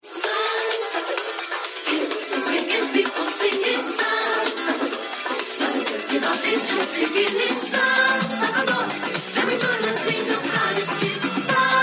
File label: Vocal Club Mix